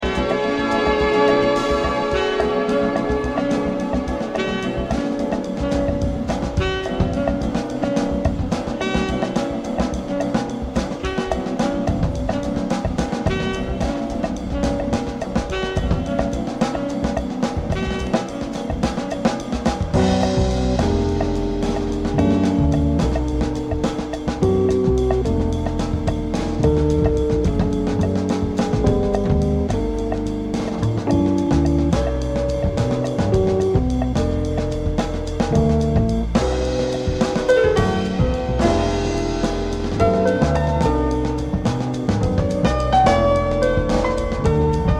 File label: jazz ambient